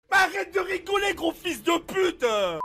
rire

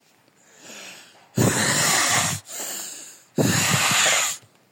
asthme

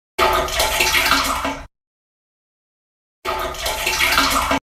diarrhee

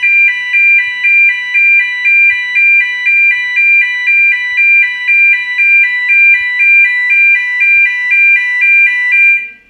alarme gaz

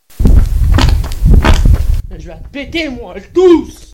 elle tousse

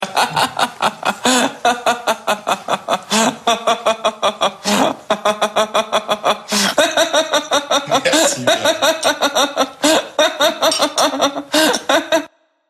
rire

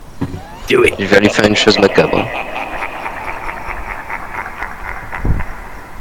le saint rire

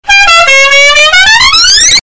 bruit de van reduction

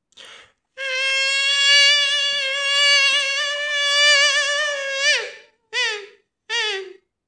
les moustiques

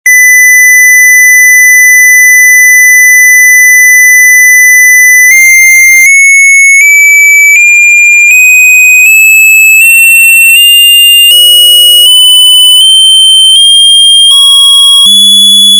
alarme

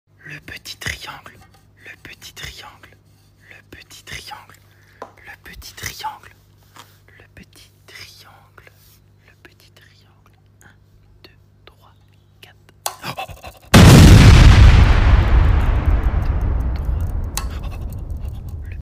le petit triangle

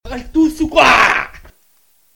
elle tousse

